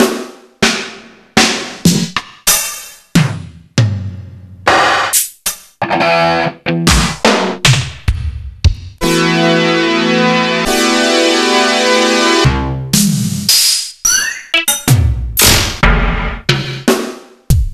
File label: EMU SP-12